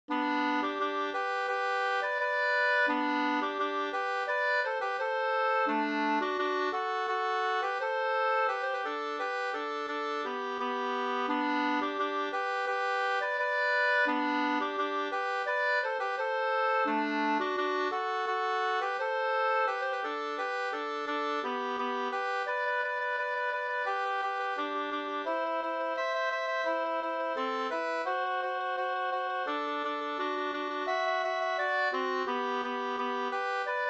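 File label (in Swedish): snoa, two-part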